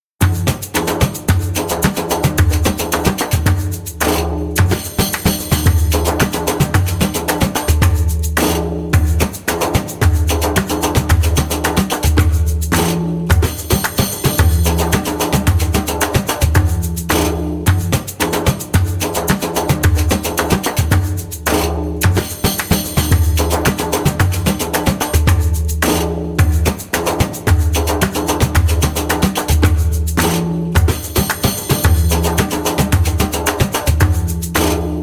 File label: Suppaa Maqsum - Long (fast), Djembolale; Djembolale - Grooves and Moves